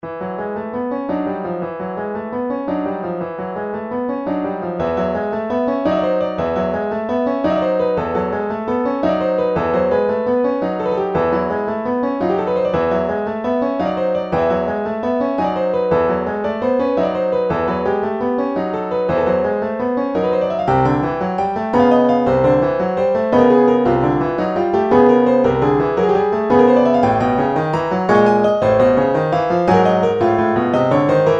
bartok piano

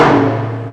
tom, drums

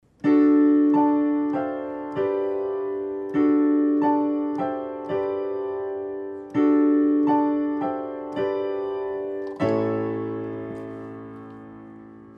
keys

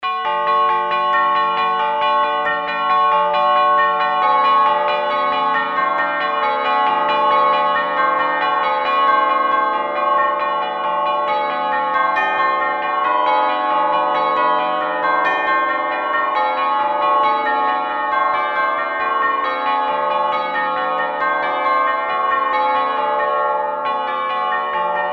bells 36edo; micro; bell tuning compare

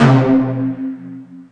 tom

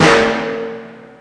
snare, drums

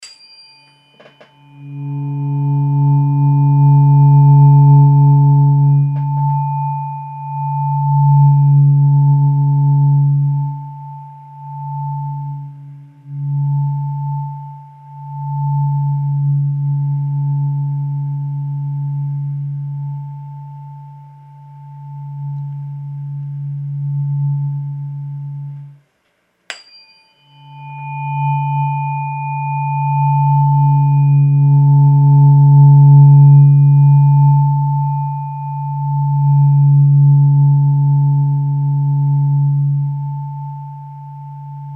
a tuning fork; metallic-stuff-samples

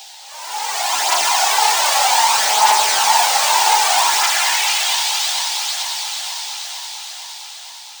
whoosh, korg

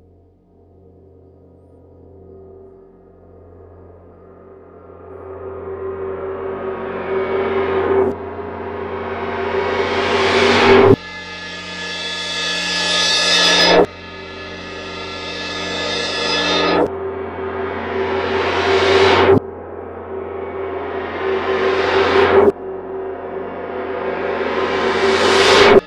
bowed cymbals; metallic

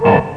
noise

